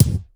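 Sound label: bass drum, effected drum; Crypton - DrumBase5000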